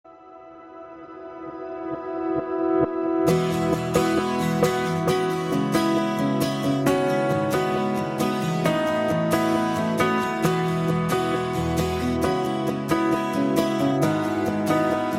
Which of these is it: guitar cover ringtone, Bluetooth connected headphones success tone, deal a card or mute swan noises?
guitar cover ringtone